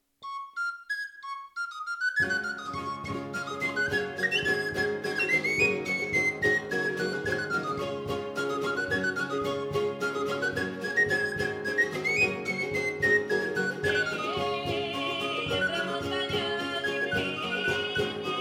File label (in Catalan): Jota amb flabiol